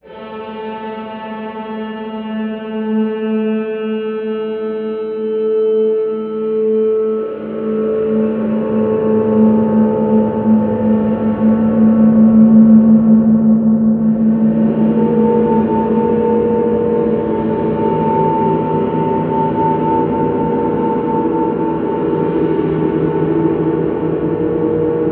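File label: feedback storm, Alien Guitars; Big Fish Audio; SONOR STORM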